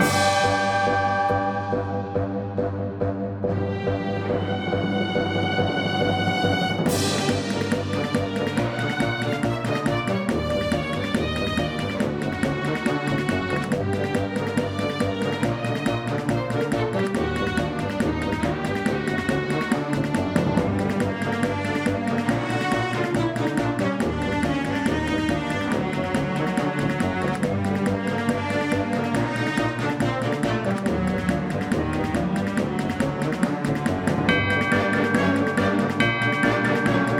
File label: ingame generic (1st +2nd + 3rd +4th Layers); wicked witches